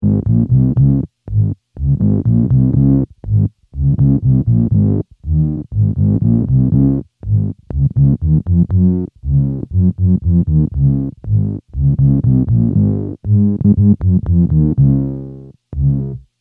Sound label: Tuba Anyone, guitar synth